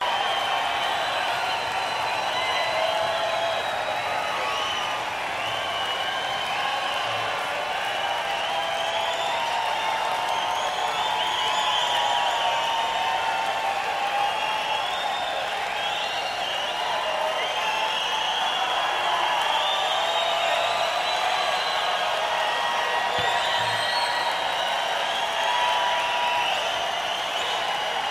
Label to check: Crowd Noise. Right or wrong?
Right